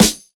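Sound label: short snare drum sample a key, tuned to the A note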